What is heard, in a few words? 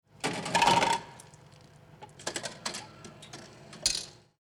coin Slot